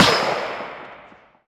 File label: result gauge stop; rankings